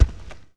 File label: Fire Titan Foot; Seer; GamigoNA